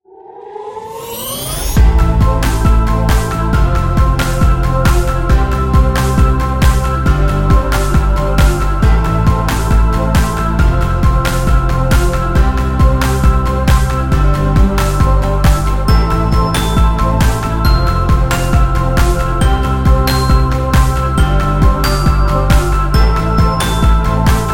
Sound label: Epic Corporate; corporate music vol 1